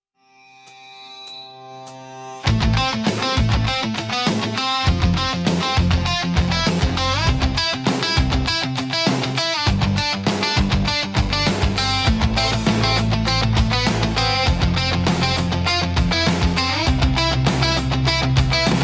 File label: instrumental